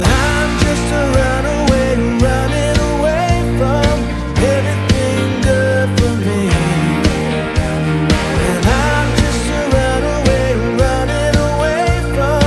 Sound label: chorus ringtone